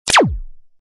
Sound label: laser blast; Bazooka-Gun-Boy